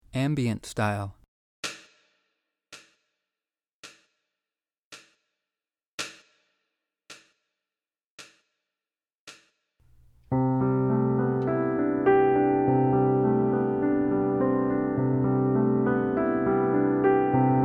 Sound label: ambient